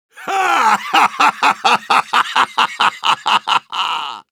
laugh long; Soldier

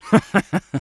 laugh short

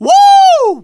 positive vocalization

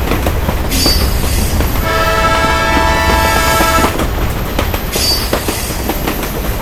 Train sound

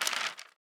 xray open; Taunt; medic

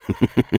Sniper laugh short fr

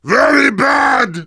Heavy negative vocalization

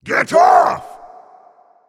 stomp; Pirate

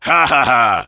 laugh evil, fr; Heavy

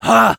laugh short; Scout; fr

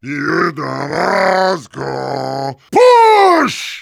Heavy singing

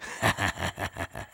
Engineer laugh evil

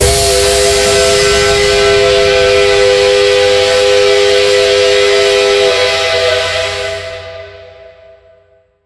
red whistle; Cp harbor

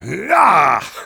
Heavy taunt exert

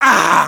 pain severe; Sniper

